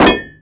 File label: Impact Metal Hits